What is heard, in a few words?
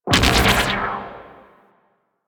EMP Blast; Consumable; Archwing; Warframe